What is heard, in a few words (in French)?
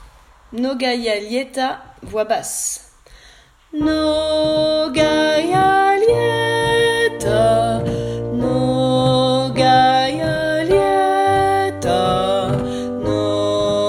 Basse; Mnogaia Lieta